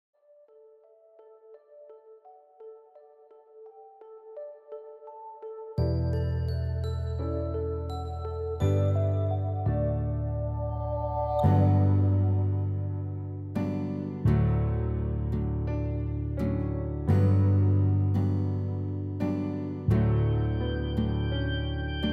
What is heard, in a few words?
Karaoke